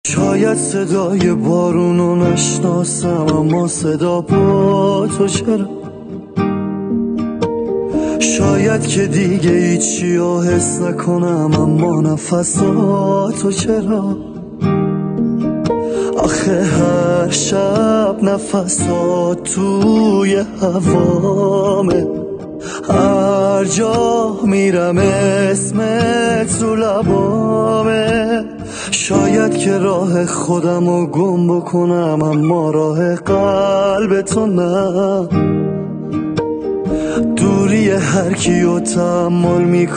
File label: Guitar